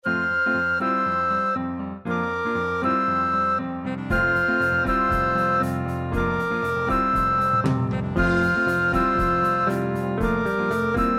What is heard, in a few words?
o little town woodwind and sax